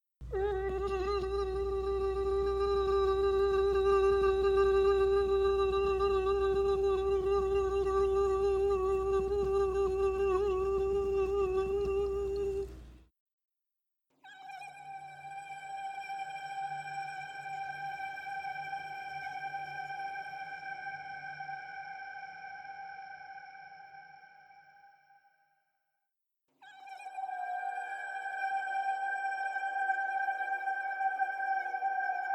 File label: animal filters mosquito; Oak; New Play “Oak” by Terry Guest, directed by Mikael Burke